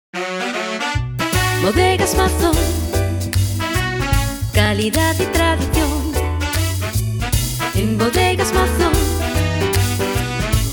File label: Jingle